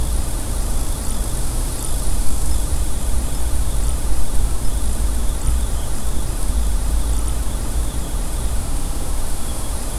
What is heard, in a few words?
night wind gently moving, fog